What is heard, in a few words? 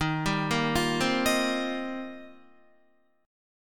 D sharp 6th Add 9th, Guitar; arpeggiated; D#6add9; 10,10,10,10,12,11; JGuitar